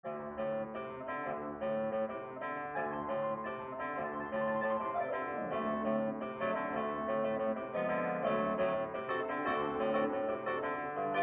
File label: Wurlitzer Electric Piano